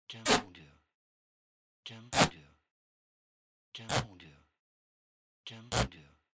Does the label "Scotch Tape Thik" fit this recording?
Yes